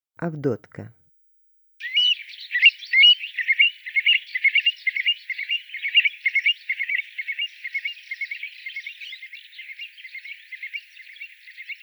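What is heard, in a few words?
avdotka golos, stone-curlew voice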